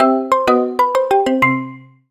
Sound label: JINGLE MISS